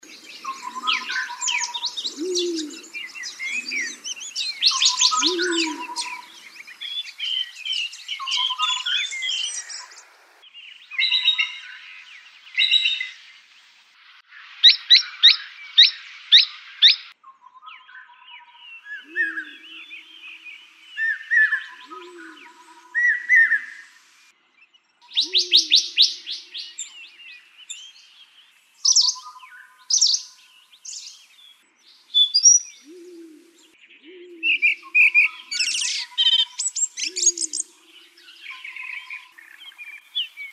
Birds of the hills

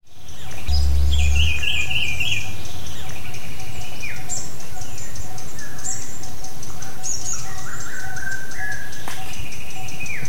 Birds at Nilgala forest; Sri Lanka

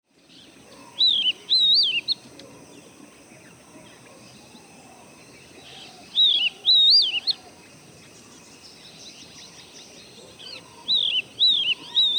Magpie Robin

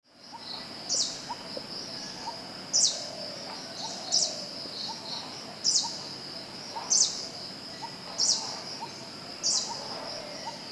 Greenish Warbler, Thekkady

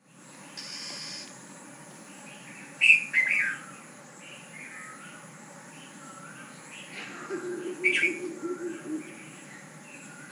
Morning chorous

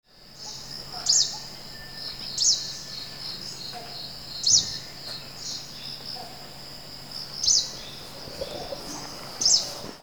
Greenish Warbler

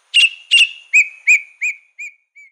White bellied Drongo

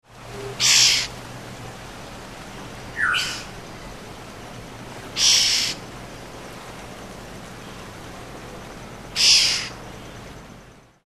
Black hooded Oriole; Sri Lanka

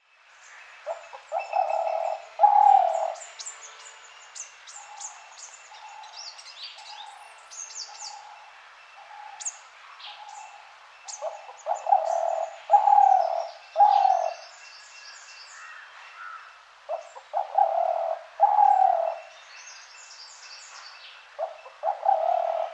Spotted Dove; Sri Lanka

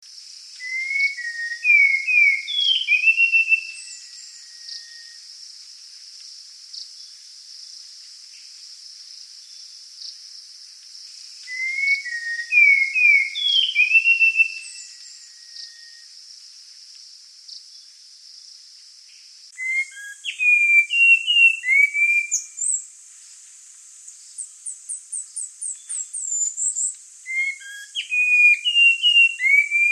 Spot winged Thrush; Sri Lanka

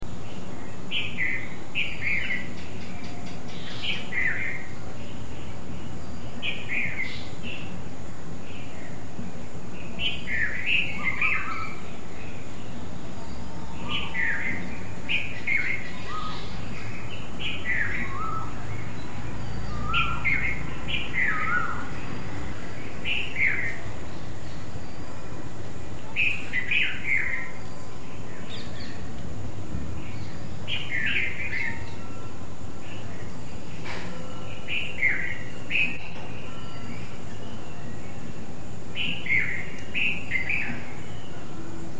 two bulbuls

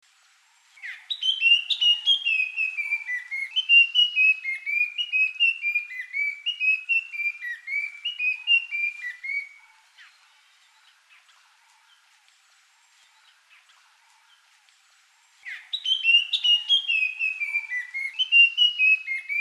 Brown capped Babbler; Sri Lanka

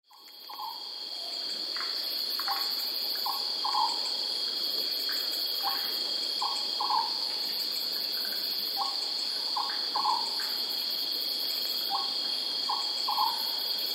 Oriental S Owl frogs Sinharaja Deniyaya; ZOOM; Sri Lanka

